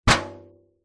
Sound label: impacts metal thin foil baking tray hit knock bash; zapsplat